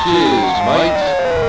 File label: CHEERS; JAGUDOME